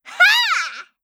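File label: attack, Voice Line, Korean; Eternal Return; Garnet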